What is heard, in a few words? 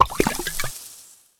Potion Underlay Play